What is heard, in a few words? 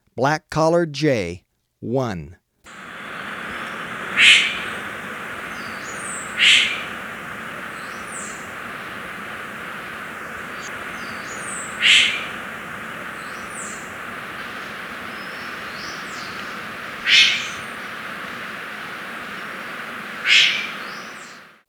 Black-Collared Jay, Birds of Ecuador; S. America